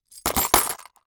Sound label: Foley Metal Pieces Plastic Tub Metal Rattles Single